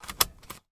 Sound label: van seatbelt click lock iveco